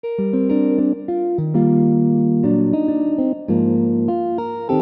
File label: contemporary chord